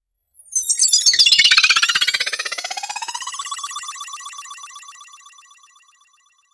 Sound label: anime shield hero power up